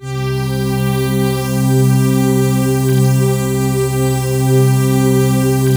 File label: C3, Synth Line; 90 s Sample CDs; NiceBeats; SynthLine VOL-17